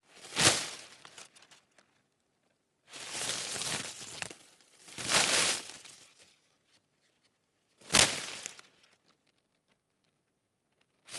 the rustle large thick hedge leaves slapped against each other; Sonniss GDC